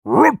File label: Yeti effort